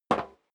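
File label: step sneaker metal dry single left, footsteps; schritte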